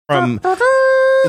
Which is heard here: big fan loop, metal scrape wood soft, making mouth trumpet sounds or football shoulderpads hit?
making mouth trumpet sounds